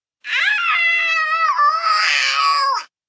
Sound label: Pain Scream Ow, Ow, Ouch, 1 child, intense pain, raspy; VoiceBox